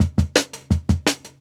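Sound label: Jungle Breaks, 170 bpm; Kreep; Original Jungle Breaks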